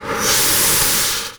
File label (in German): druckregler abblasen, volvo 9900; Vehicles; AI volvo 9900; Freyfurt